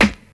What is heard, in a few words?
full snare single hit c sharp key